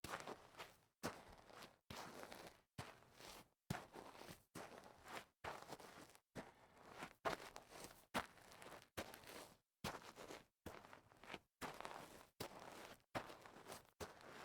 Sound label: sneaker on dirt series slow, foley